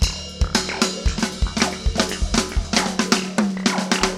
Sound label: drum; FUNKIN&GROOVIN'